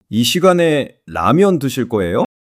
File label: surprise